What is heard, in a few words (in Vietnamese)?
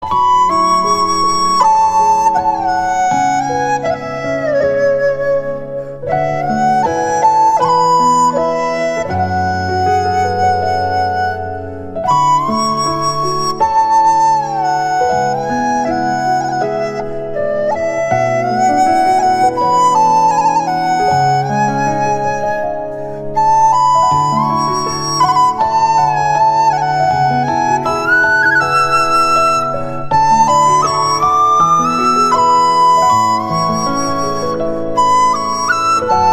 Sáo Trúc